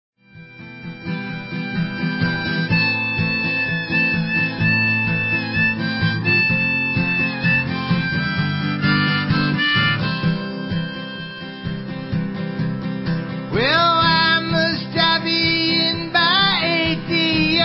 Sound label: Acoustic